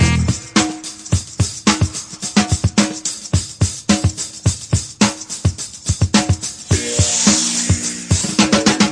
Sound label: 108 groove, drum loops